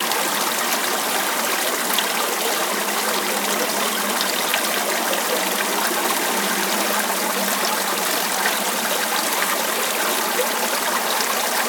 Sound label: Water SFX; Trap and Dubstep